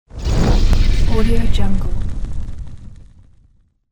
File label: fire spell